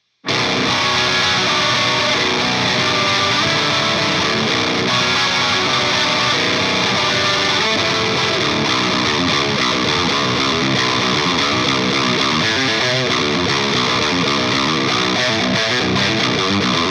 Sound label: Riff Clipping On